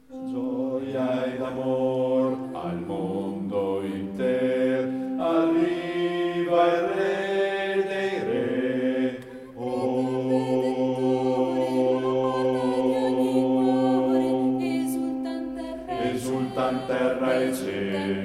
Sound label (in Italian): Joy To The World, bassi